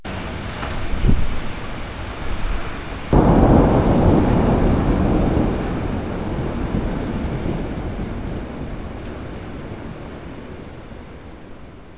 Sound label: thunder